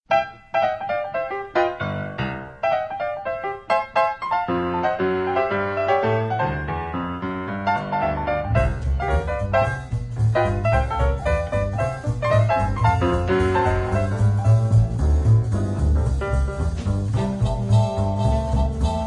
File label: jingle